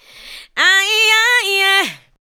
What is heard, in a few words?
ADLIBS